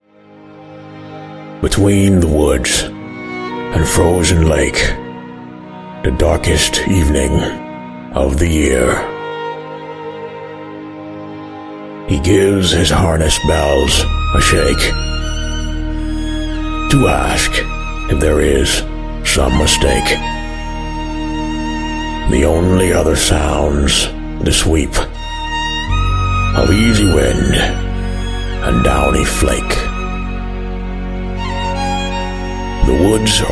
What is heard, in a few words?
dramatic